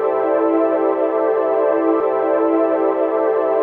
HEAVEN L, ATMOS; AMG - Vince Clarke Lucky Bastard

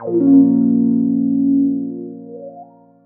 GUI CHORD; EastWest; Dance Mega Hip Hop Electro

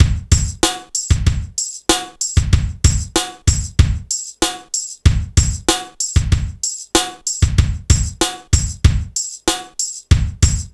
ROCK; INIS; Funky Xtreams

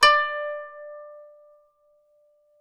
KPES D5, KANUN; SoundBytes; Early Patches; KANUN SMAL

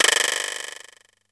QUIJADA, D110 DRUMS; Crypton - DrumBase5000

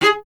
STR VC G#2, Cello; Miroslav Vitous; Solo Instruments VOL-1

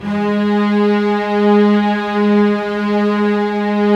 STR SEC, STRINGS; Roland - LA Composer vol. 1

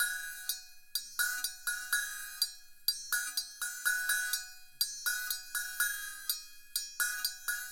CYM, Ethno Techno; ILIO - Ethno Techno

